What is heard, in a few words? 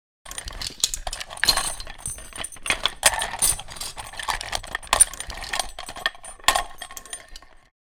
Sniper rifle brass shell foley movement